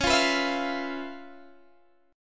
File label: C sharp 7th Sharp 9th Guitar Standard, chord; strummed; C#7#9; JGuitar